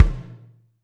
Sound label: Kick, Alesis DM5